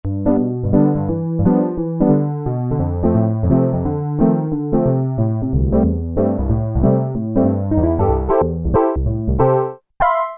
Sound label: Korg Poly-800, Chorus Piano